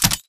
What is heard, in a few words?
Mvmt FS Walk; AI Tick; Titanfall 2